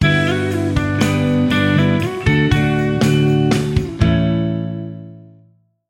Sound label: Relaxing Country Guitar; MIUI12